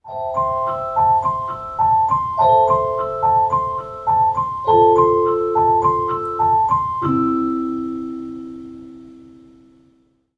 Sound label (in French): sonnerie